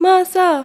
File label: GIRL, GIRLVOICE, Arabian Traditions; USB Soundscan vol.43; AKAI